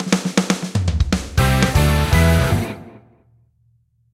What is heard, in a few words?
Rock Jingle; Yousician; Android iOS macOS Windows